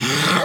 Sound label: Puppy Idle Jump; Warframe; Kubrow